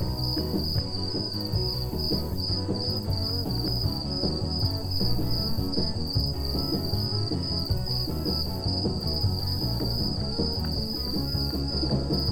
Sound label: start Screen Loop; netent